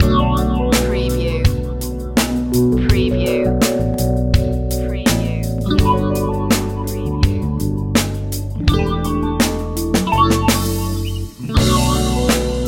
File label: life grooves loop